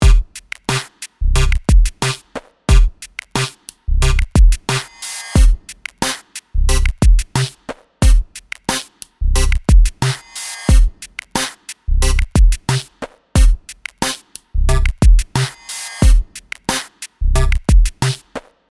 plus velocity drums, sequencer; Numerology; Five12; The timbre of this particular synth patch responds to velocity values

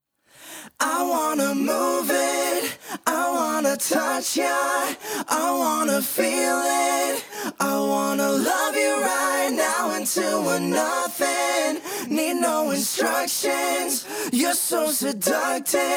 vocals squash

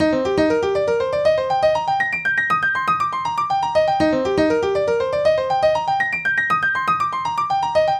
c minor chord arp octaves

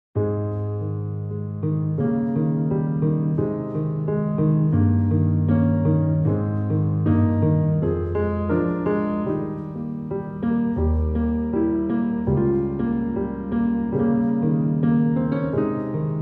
sustaining grand

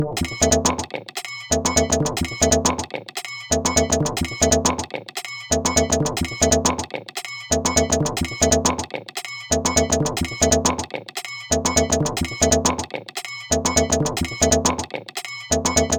Modular; sampling instrument; Rando by Monkeyc; Atlas

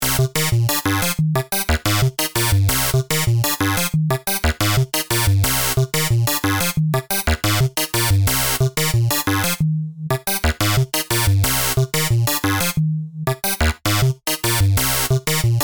adjust step length, sequencer; the drums stay in 4/4 while the sequence length expands and contracts, depending on the total value of the combined step lengths at any given time; Numerology; Five12

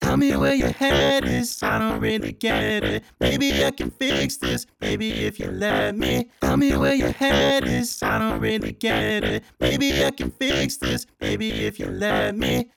seq beat; manipulator

